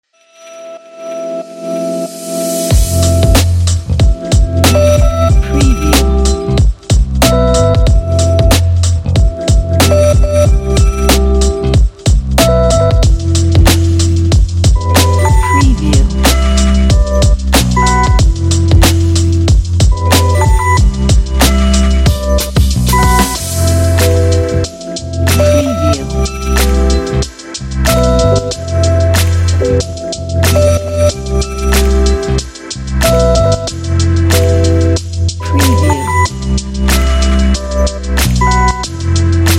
lounge fashion hip hop

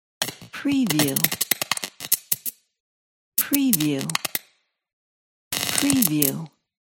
glitch

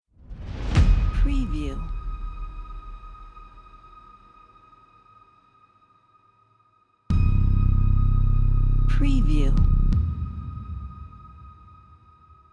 impact dark trailer

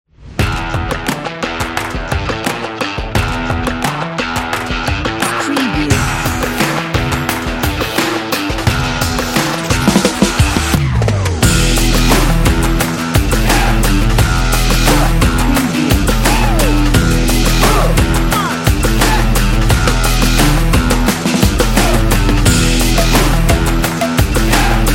action advertising background

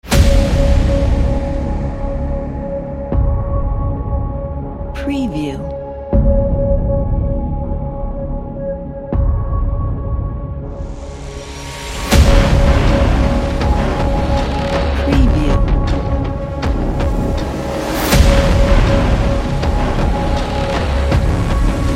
dark trailer

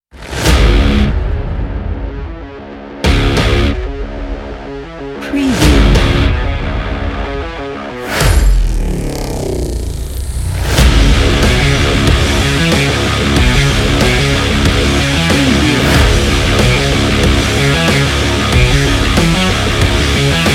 epic rock trailer

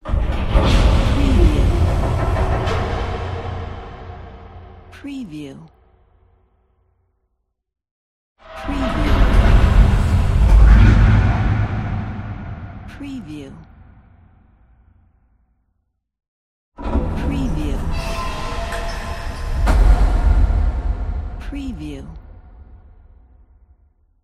dark horror

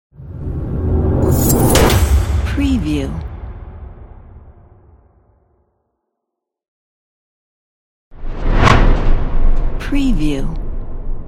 cinematics

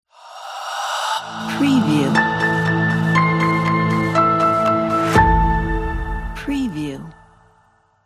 logo

